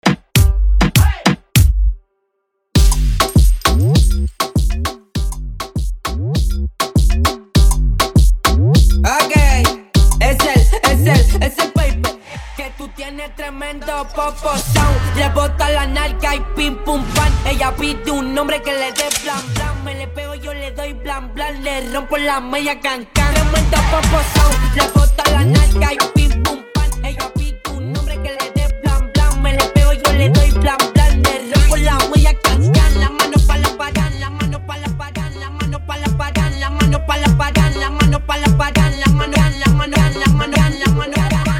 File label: intro redrum afro 100 bpm; Prodeejay Remix